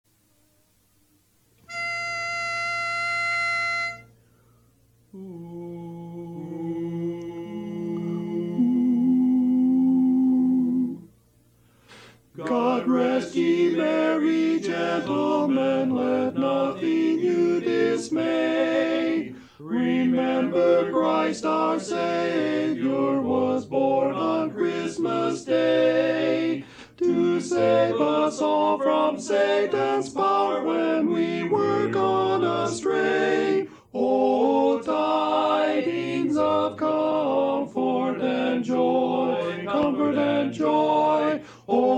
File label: Tenor